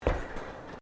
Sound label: Footsteps Boots Suspended Metal Walkway Step; Footstep Foley; Human